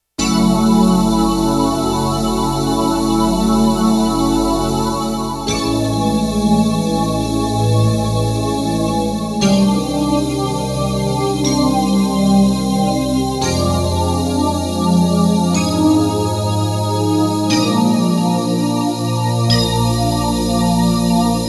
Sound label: Syn Stack, Roland JX-1; Factory presets; Additional factory patches